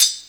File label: smooth hat sound d sharp key; hat samples